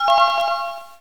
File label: join; wesnoth